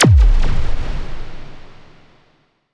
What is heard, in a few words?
Hits & Explode